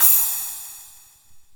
CYM SPLSH; roland2

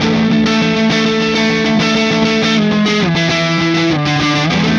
Electric Guitar, Loops; Guitars

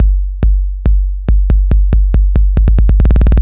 Kick Loop, Drums, Dance Beats

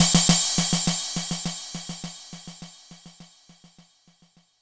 Snare Loop, Drums, Dance Beats

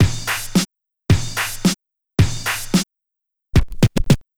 Turntables

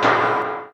cursor select; theGoonies; Retro

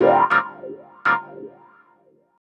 Electric Piano, Loops, Keys

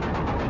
big rock winch; theGoonies; Retro

